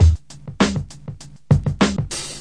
warm coffee, drum loops and breaks, 099 bpm; 091 to 100 bpm

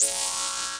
static warble, Electronic Hits

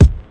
classic hip hop kick; Classic Hip Hop Mega Kit

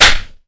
nasty stormfront clap

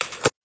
eject loop; Ze Franks Pain Pack

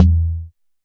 Jomox MBrane drum machine; Jomox MBrane 11

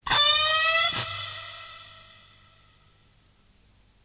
guitar bend; single samples; Guitars

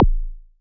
popper, Kicks; single hits

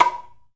ohkawa open, Japanese Drums; ETHNIC and WORLD PERCUSSION

